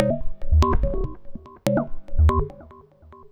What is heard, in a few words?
Mod Loop, Modular Percussion